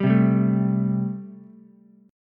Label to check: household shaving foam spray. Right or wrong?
Wrong